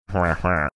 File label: making funny sound